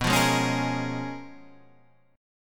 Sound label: Db 13th B, guitar